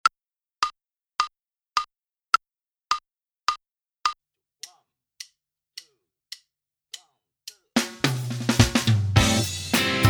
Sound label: Minus Bass; Inst Ensemble